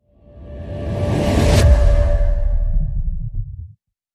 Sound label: Hit Upsetting